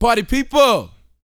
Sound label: VOCAL F; ILIO